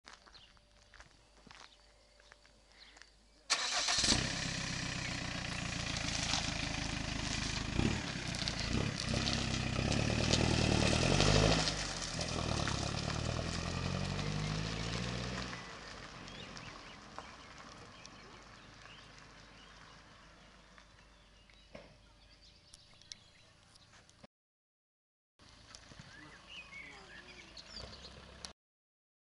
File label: jumper exhaust